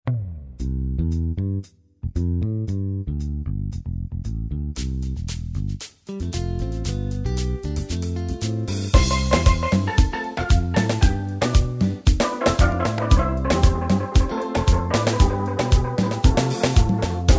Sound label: Soca